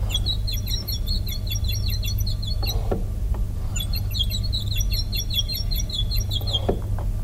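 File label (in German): Grenzing 7 II P, pfeifenorgeln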